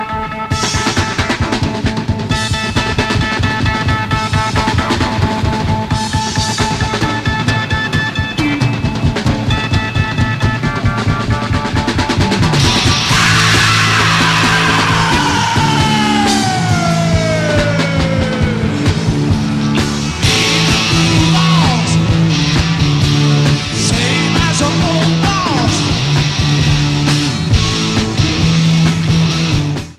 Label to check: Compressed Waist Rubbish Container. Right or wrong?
Wrong